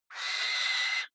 owl shriek; natur tiere; voegel

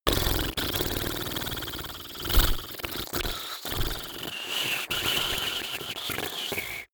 blow lips; koerpergeraeusche; menschen; soundpool

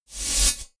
notification noise swell double short; kommunikation; signale

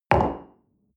coffee place table medium, restaurant cafe

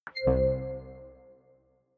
click tonal reverb

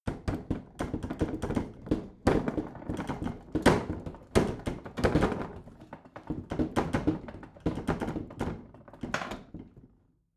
bathroom cabinet rattle; objekte; tueren

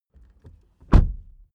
suv close door

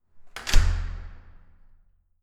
entrance door close hard

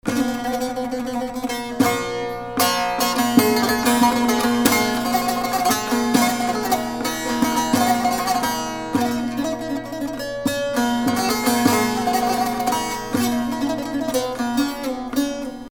oriental salzer solo; music elements; grooves

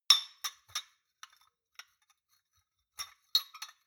shot glasses handle, gastronomie; berufe; soundpool

